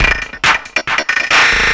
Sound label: percussion loops, Techno; PS-45